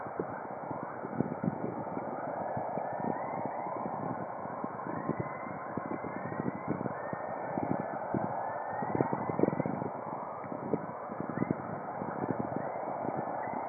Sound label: chorus hiss whistlers, sounds of space; Halley